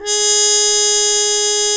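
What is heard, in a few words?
G#4, mute trumpet; AKAI S-Series CD-ROM Sound Library VOL-2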